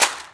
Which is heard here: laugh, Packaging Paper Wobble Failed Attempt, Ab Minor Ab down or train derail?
Packaging Paper Wobble Failed Attempt